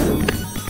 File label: smack